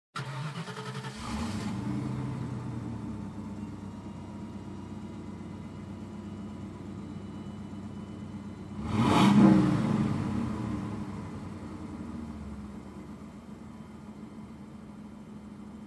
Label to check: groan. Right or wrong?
Wrong